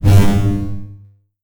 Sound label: magic wave